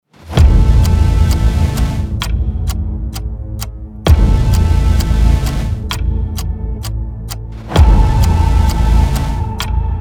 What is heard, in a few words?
Countdown Trailer